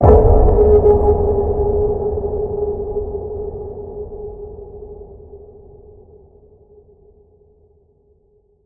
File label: Deep Waters Ping, G sharp